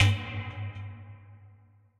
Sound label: Metalink, Percussive; Build and Drop